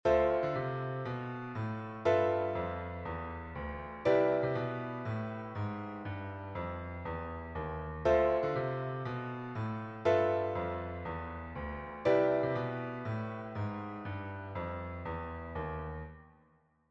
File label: walking bass; Progression 1-8; Enchainements ii V I